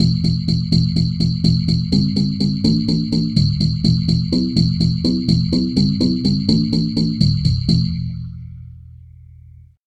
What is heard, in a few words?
tubular bells bass